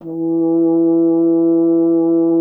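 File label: French horn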